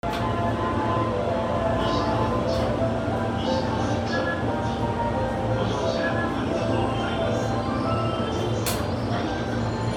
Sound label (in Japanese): JR京浜東北線西川口北, train sounds